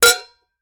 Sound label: Metal Lid; 1001 Sound Effects